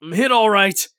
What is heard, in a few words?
hurt light; Escape from Tarkov